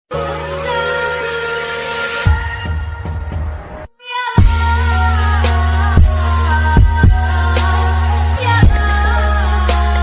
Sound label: mood off ringtone bad boy ringtone boy attitude ringtone